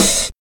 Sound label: dark tone open hi hat sample C key